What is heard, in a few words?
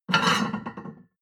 plate on plate take slide hard